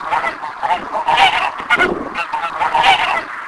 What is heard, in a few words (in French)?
oie